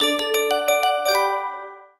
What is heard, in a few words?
msg tone; ringtones; sms